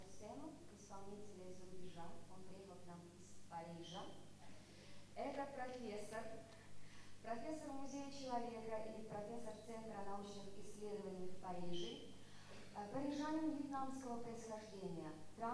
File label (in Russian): Хоомей; Франция.Вьетнам